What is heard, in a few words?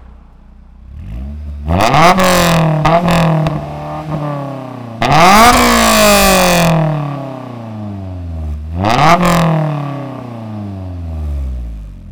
rev; s2000; s2000 modifications parts